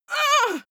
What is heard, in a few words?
female hit; soundpool